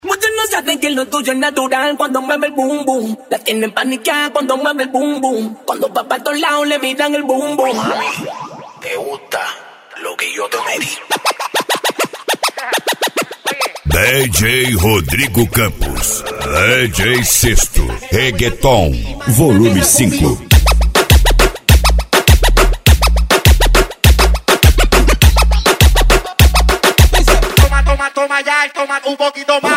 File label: Reggaeton